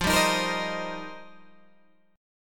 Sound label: F 7th Suspended 2nd Suspended 4th, Guitar Open G